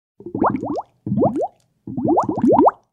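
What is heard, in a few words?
bubble sound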